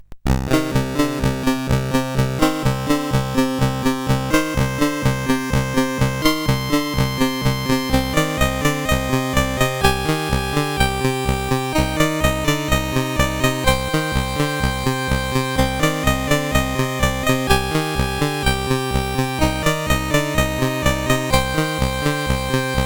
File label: En Equipo, sid CSG8580R5